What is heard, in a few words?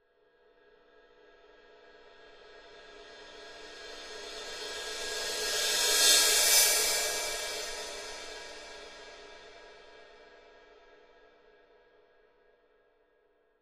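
Cymbal Thin Crescendo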